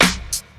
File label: trebly snare sound b key, steel snare drum sound tuned to the B note; snare drum samples